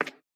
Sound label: Peg Leg Footstep